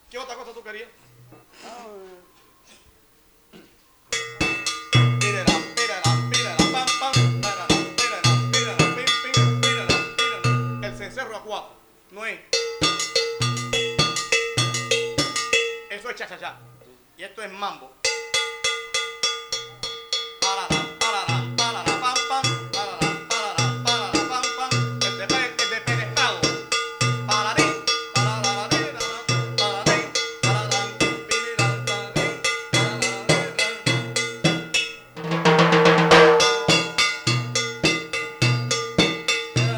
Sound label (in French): musique Differents mouvements rythmiques des timbales